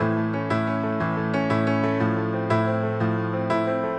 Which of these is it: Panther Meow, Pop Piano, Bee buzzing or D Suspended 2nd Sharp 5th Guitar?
Pop Piano